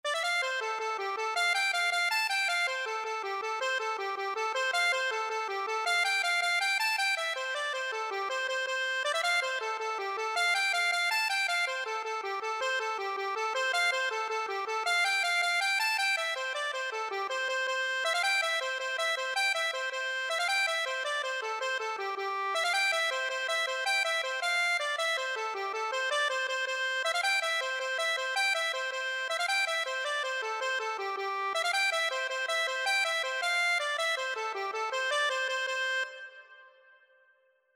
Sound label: fiddlers heaven, accordion